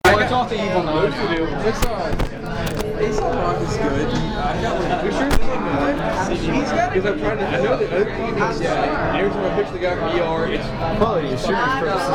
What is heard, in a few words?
Bar Noise; Richmond VA